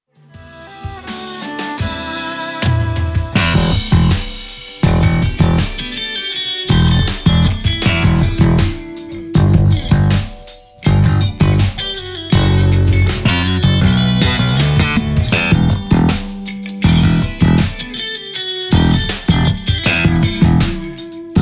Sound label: Slap; Alusonic Custom Shop J Special Deluxe